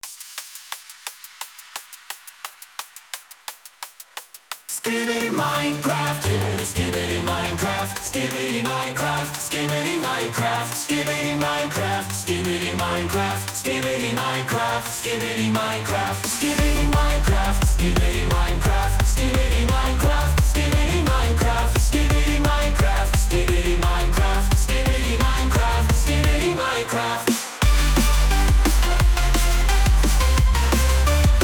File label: Drum & Bass